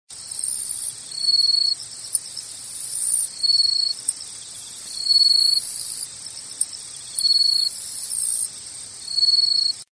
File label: crickets; svencoop; evilmansion